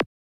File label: zap, grime, 140bpm; Zero-G Sound Sense Grime Scene; GS Construction Kits; Its Primetime